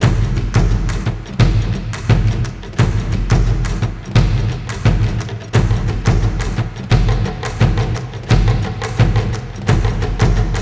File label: Siege Field Drums; Ninjago Monastery; lego universe macos windows gamerip 2010